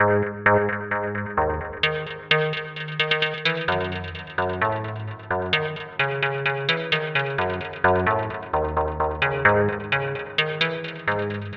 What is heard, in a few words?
loud, loop; Mindcubes Inside the Twisted Gravity Puzzle